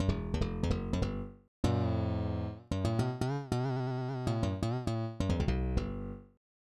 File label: broken slap bass thing